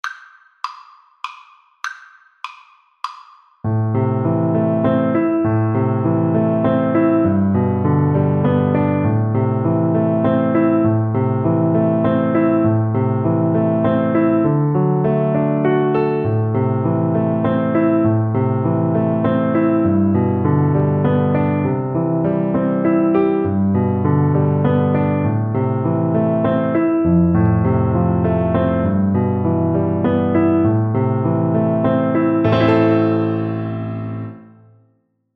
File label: Double Bass